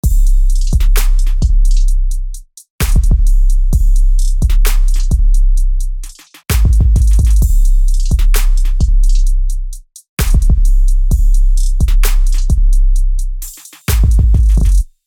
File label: Drum Kit